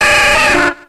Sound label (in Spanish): Grito de Squirtle